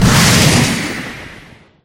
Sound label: full body straight, battle